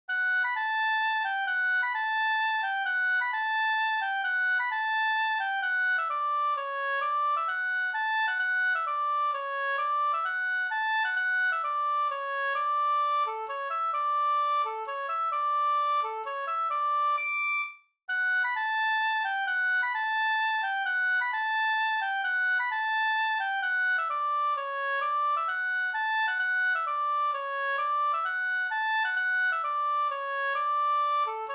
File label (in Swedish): folkdans; Fiskardansen fr Bh Ahlstrom219